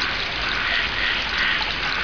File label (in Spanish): Arroyo Grillos